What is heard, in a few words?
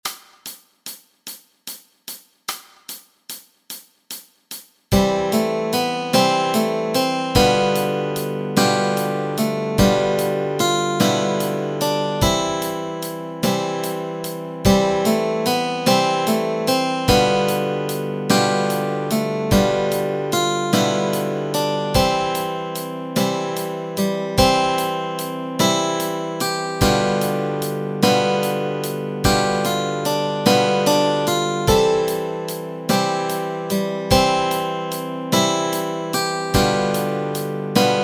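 melody and chords, Easy Guitar and Ukulele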